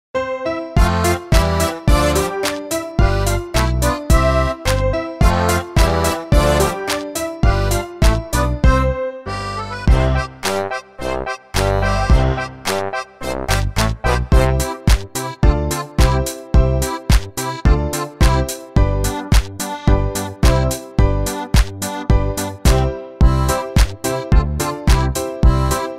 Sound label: instrumental